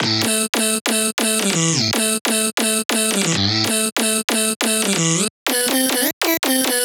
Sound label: 140 Am Hard Melody